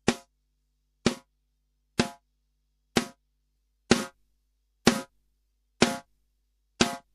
Snare Funky

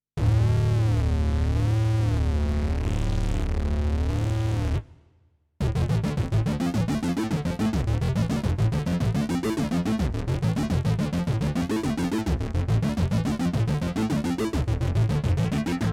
Arpeggiator Total Filter

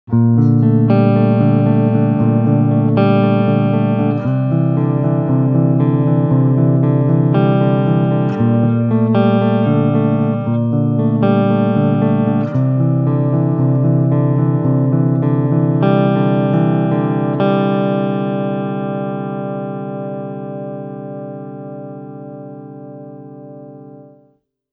Humbucker Front clean aktiv

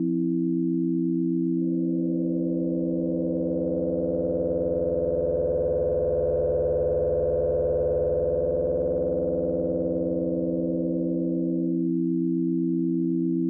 Waldorf 2 Pole Sine Pad Rectifiy

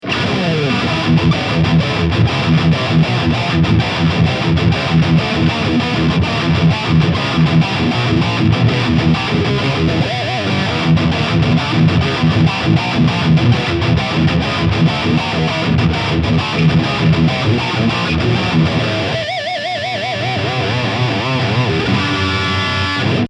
Dean Guitars ML Oceanburst High Gain

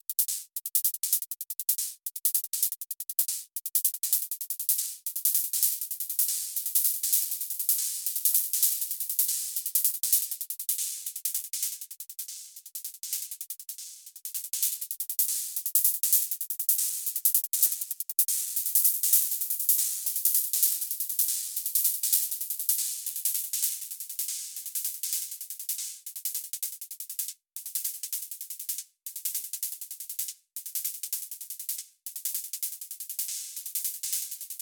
Erica Synths Hi Hats Decay Tone Accent

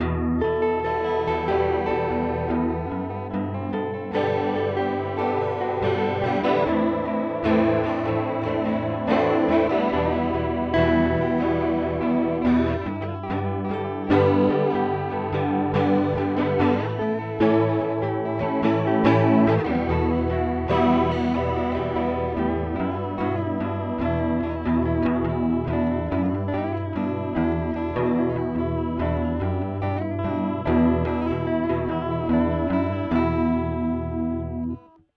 Chorus; Astra Modulation Machine

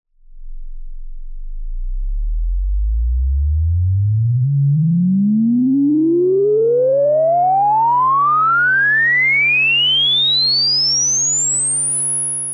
Moog Sub25 Filter Resonance

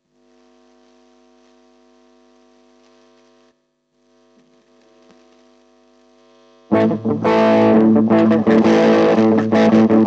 Spectral Denoise

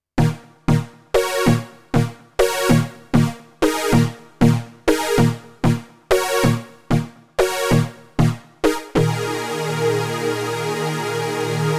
TubeSynth; AKAI MPC LIVE II

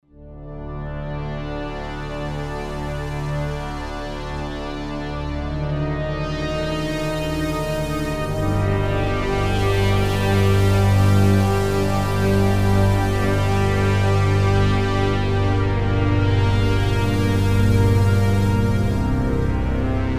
Pad korg Z1

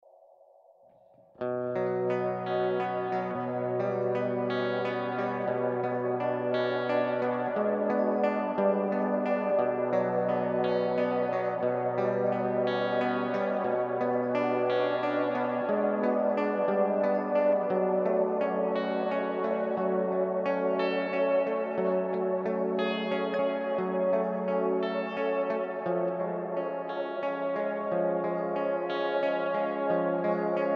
Kemper Profiler Stage Ambient

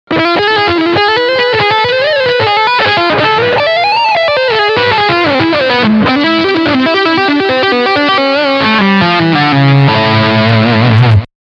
Lick Distortion Front EMG

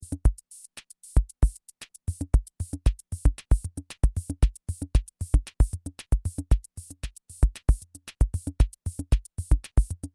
Stutter Volca Beats

